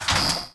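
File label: Stalker Movement Servo Damaged Walk; Titanfall 2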